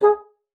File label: bassoon, G#4; Xsample VOL-6